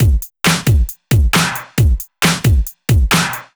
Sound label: 135 DRUMLOOP; Loopmasters; Booty Breaks Vol.2